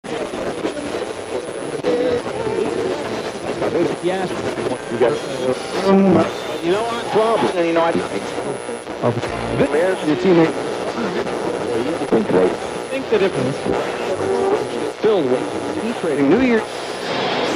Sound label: radio tuning; radio signals